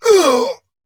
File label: Pain; Warframe; Dax Ashigaru; Battle Barks